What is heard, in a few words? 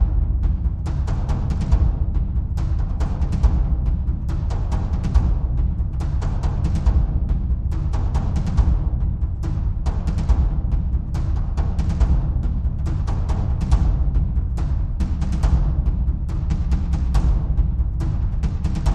Loop Layer Deep Drums